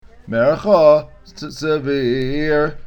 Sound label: marka tver, cantilation